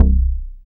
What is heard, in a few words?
BAS MKS-50, Roland